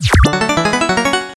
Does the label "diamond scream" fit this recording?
No